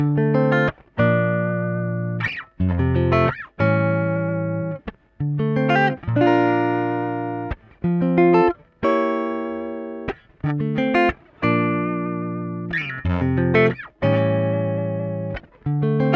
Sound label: Bridge, guitar; Alex K